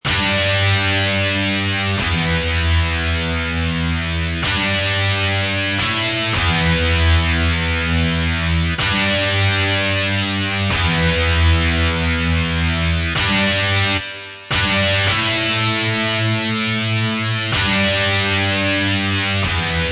GUIT; Mobiclic51

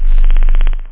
sub base, bass, synth; da capo vol1

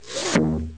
Zoom In; Marbles

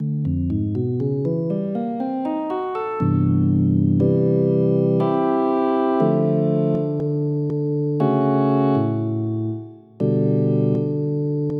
Organ; EverQuest

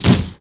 hit; BESTSHOT; noisybit

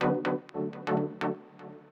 synth house 125 A; eJay; Keys

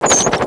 fly; BANZAI

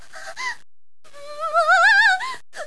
moo; Aeka

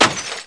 tap close; Loewe1; HAM

